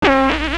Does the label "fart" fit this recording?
Yes